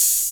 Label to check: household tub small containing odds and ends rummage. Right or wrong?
Wrong